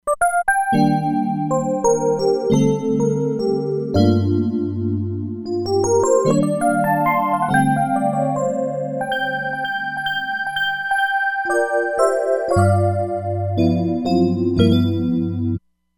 Elec. Piano, E-mu Proteus-3; Factory patches

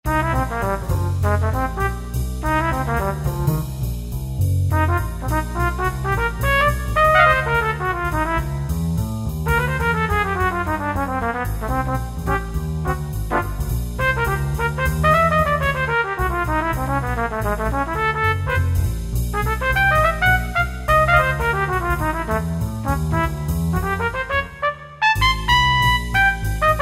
Trumpet Trio

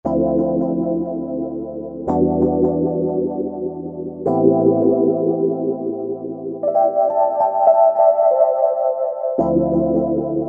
Psycho Rhodes; Roland JV-2080

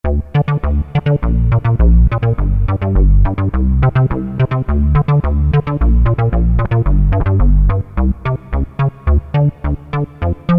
Synbass

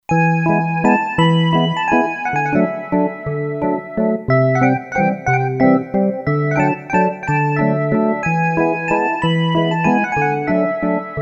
Organ Pad, Kurzweil K2500X; Factory Programs

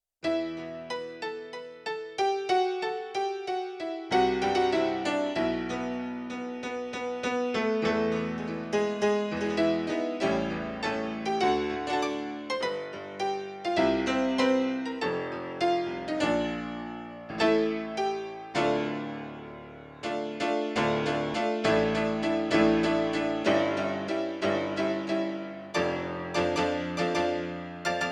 Octave Dark, Yamaha CFIII Grand Piano; Yamaha TX16W

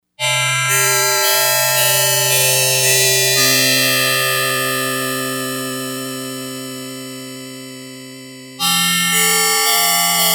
Windbells, Yamaha DX100; Factory Patches

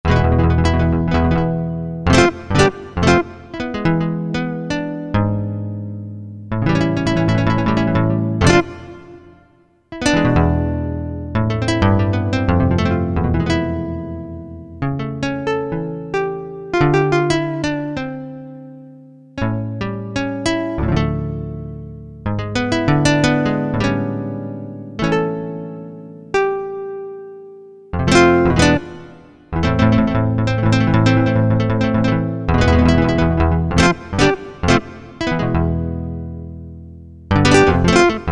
Spanish gtr, Korg MSC-6S Fretted Insts; Fretted Insts 1

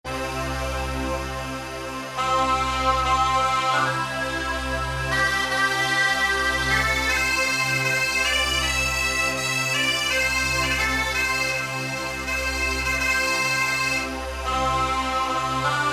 Bag Pipe; Roland SC-880; Factory Patches

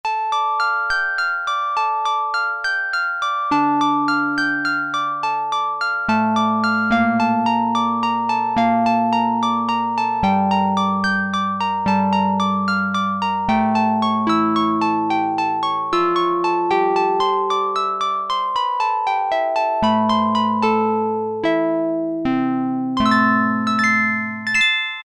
Celeste, Roland Juno-106; Factory Preset

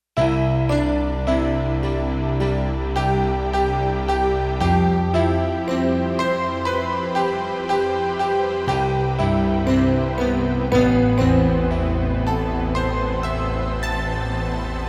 Bright EP Strg, synth

